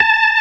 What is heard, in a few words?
organ, all stops; Keith Emerson Signature Series